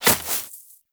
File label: rodent hop step rear grass, forest rodent footsteps; Foley; Warframe; Characters